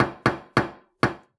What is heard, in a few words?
Door Creak